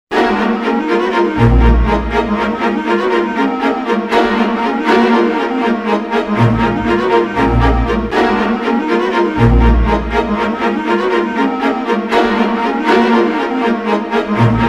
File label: Movie Suspense; Soundzabound Volume 3 Disc 2